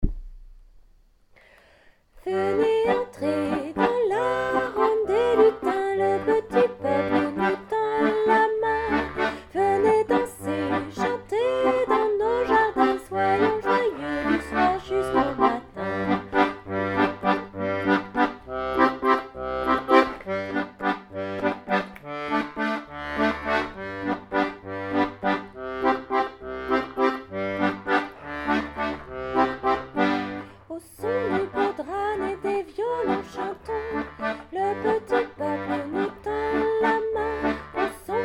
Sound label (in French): ronde des lutins avec instrumental